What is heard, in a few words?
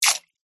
human spit water out into metal bowl short small amount